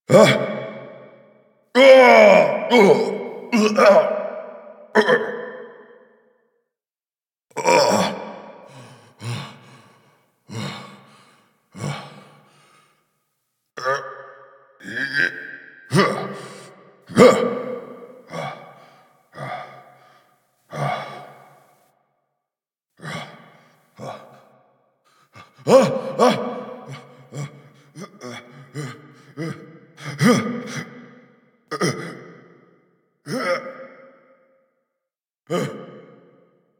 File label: Breaths; Kahl; Warframe; The New War; Grineer Eidolon Shotgun